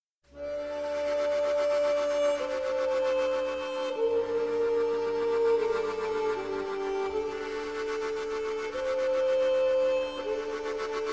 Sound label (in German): Säbeltanz Quartett, Mundharmonika